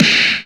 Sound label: Splash Hop; SNES; Seiken Densetsu 3